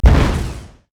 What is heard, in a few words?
tower; LoL